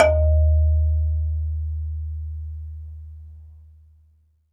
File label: MARIMBA; ILIO